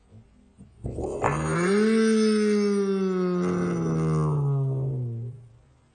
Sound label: Deinonychus, Dinosaur Roars